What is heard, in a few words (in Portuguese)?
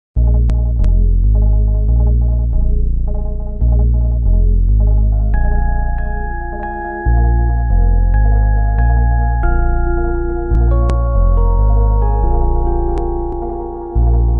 samba